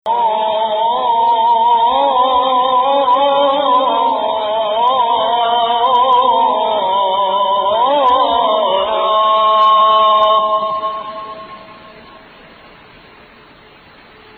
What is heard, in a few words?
Hijaz Adhan Bayati, Adhan (call to prayer)